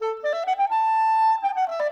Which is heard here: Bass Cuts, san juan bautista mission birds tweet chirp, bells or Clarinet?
Clarinet